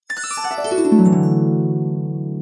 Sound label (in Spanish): magia; alquimistas